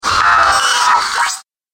Sci-fi Laser Machinery; Science Fiction Sound Effects

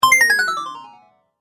Game Drop Prize; Video Game Sound Effects

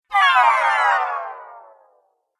Amazing Magic Shrink; Magic Sound Effects

